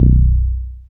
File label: BAS FM DX-7